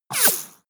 bullet large whoosh miss

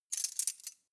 cutlery teaspoons handle short

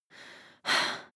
breath female slightly aroused

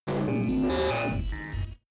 space computing machinery hard busy

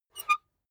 puncher squeak release

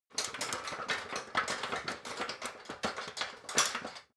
ladder metal rattling long

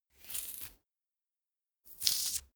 mandarin slice separate

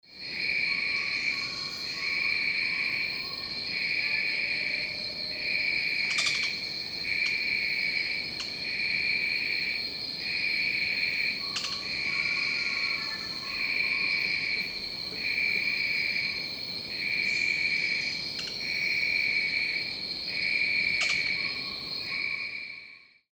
cicadas small group

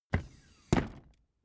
office chair hydraulics up

